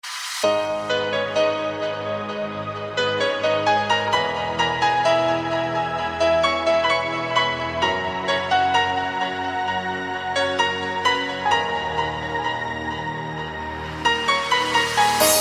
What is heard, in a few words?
forever piano ringtone